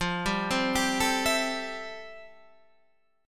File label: A-Minor 7th F; Am7/F; arpeggiated